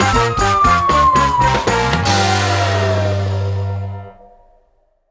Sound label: big Win End; reel steal